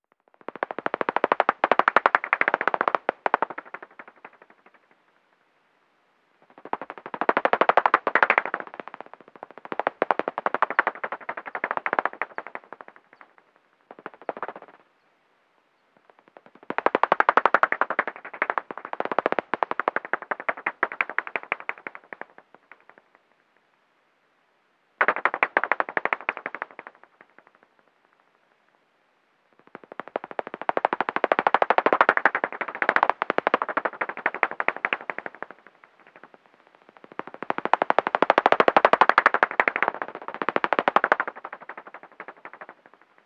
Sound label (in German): zwergfledermausrufe